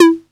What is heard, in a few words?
808, CONGA, Electronic